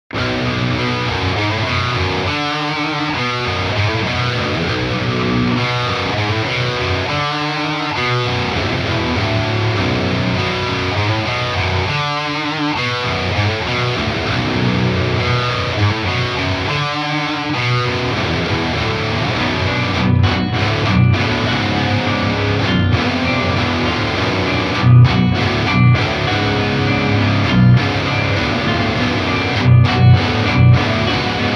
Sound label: Iratus Lead Crunch mode clean; TappAmps Iratus